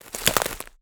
wood crushing branches; BlueZone; Wood Sound Effects

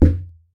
impact Punch heavy; Kenney's Sound Pack; Impact Sounds

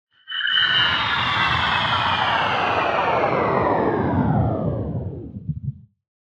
Shut Off Engine, Sci-Fi; Mechanical Wave; Sci-Fi-Invaders

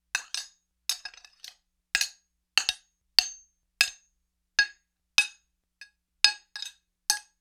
Mace Spear Blade; StillNorthMedia

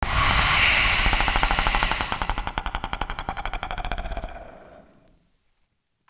Aliens Monsters; FXHome

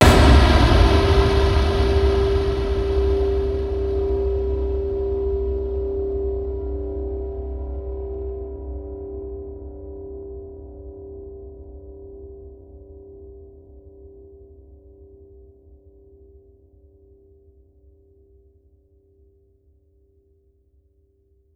Valve, Cinematic Impacts; 99Sounds; Rumore Cinematic Impacts

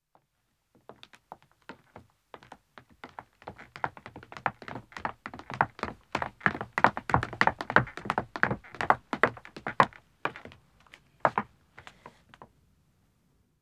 Wooden Stairs Descending, 1 Ma, Footsteps; BBC Sound Effects Library; Footsteps II

